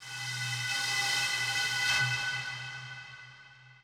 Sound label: bottle, ethno, 125